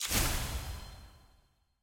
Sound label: burst; eog eternals